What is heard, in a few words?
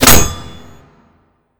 Hit; Sarco